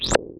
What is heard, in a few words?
flop; solarwolf